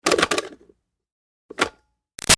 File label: Switch Plastic Bubble Machine On-Off Rough; Switches; Objects; Big Room Sound